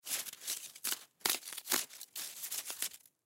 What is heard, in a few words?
Snow Dig Through With Knife; Ice; Weather; Ice Sound Effects; Blastwave